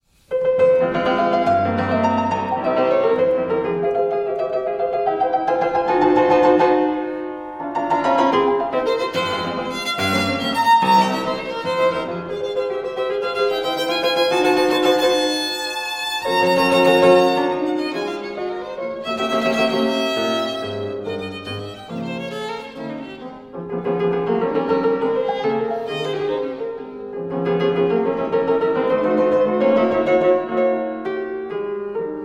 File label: sonata in F minor allegro agitato; sonata no. 2